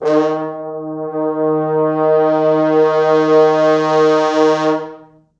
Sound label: 4 FrHorns